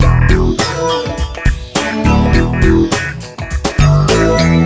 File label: Funk acid; FUNKACID1